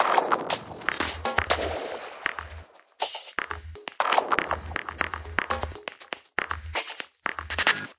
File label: shutter down the glitch, 120 bpm, drum loops and breaks